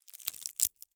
CRACK Bright Crisp; mono; Universal Sound FX; BREAKS & SNAPS

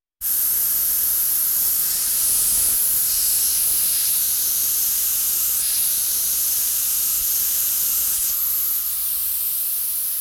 Walking Machinery Trucks; Valentino Production Sound Effects Library CD50

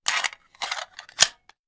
Hand Gun Foley; Sound Ideas; Guns

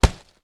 THUD Medium; mono; Universal Sound FX; THUDS & THUMPS

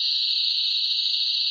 Crickets; Animals; 1001 Sound Effects

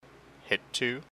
hit; blindside

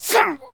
Male Hit By Pitch Baseball

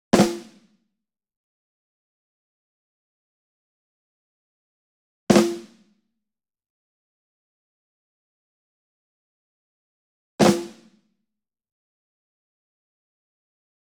ENSEMBLE SNARE Group Flam Near; boom library; Cinematic Strikes Construction Kit

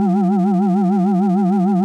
Sci-fi Hover Bot Loop; SFX Kit; Sci-Fi Loops

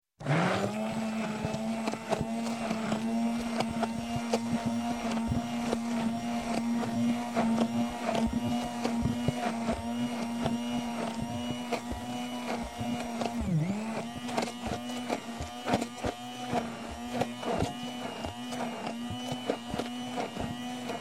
Mixer, Hand Electric Hand Mixer Mixing Liquid; Sound Ideas 2000 Series

